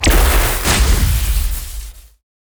Elect Shot

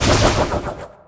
Weapons Roll

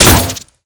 Weapons Arrow Shot

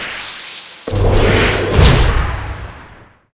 kaboom

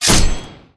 SWORD

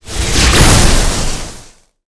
Elec

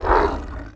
Hit

